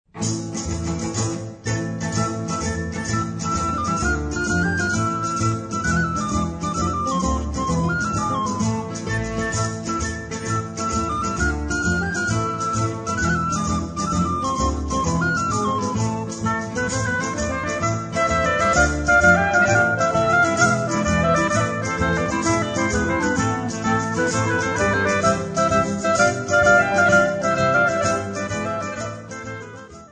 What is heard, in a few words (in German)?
Tarantella Italien, Accordion; Tortorella